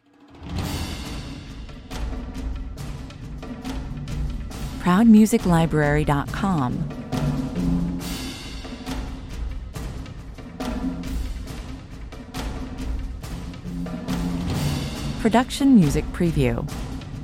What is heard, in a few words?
percussion only